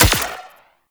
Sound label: Turret Fire; Warframe; Liset; Gyro